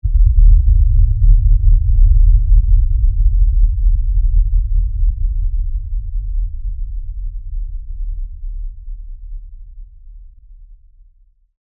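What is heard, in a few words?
Impact Low Frequency Effects Rumble; Rumbles; Sound Design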